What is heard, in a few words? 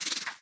Ruin Flying Sentry Prepare